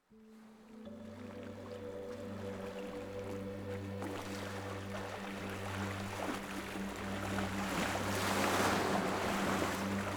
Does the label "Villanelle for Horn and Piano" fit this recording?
No